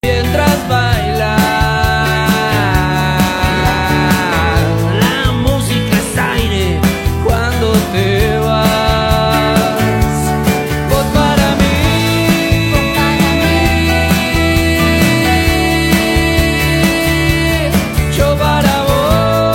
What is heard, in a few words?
MUSIC